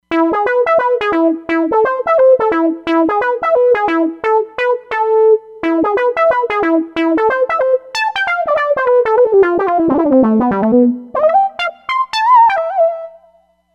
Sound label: Dig-n-Duke, Roland Fantom XR